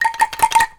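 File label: "A#4", Java angklung; AKAI S6000 CD-ROM - WorldSounds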